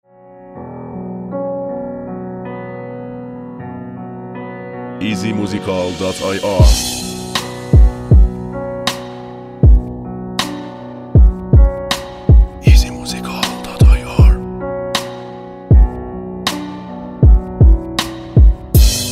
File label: Beat